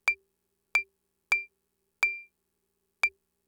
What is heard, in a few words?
Fricke MFB-522 CLAVES